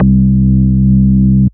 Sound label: Ultimate Bass Root C; Vengeance Samples